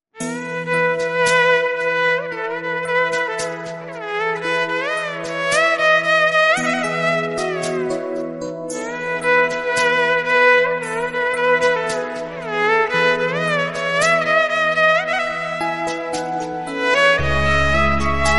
violin

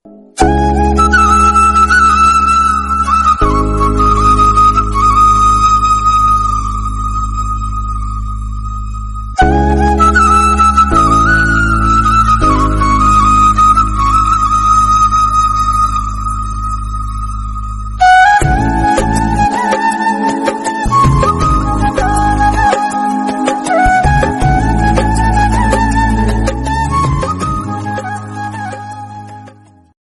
flute, bgm; Titanic

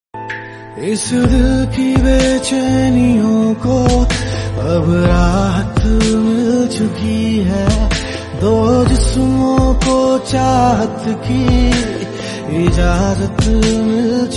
new bollywood song

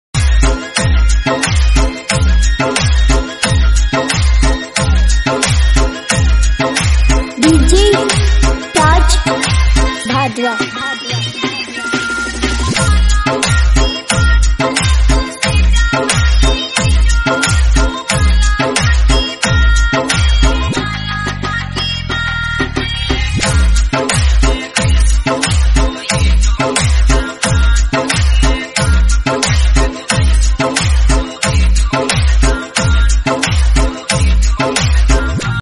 love mashup dj remix song

